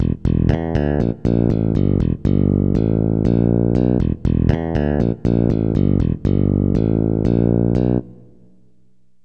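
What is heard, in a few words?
finger bass; gm-sound; Roland; An Invitation To The Roland World Of Music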